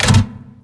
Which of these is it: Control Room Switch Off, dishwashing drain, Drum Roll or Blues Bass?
Control Room Switch Off